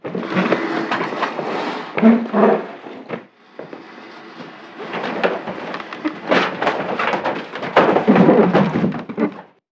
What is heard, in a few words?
barricade build; Modern Warfare